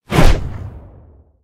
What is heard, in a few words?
shell whiz; War Thunder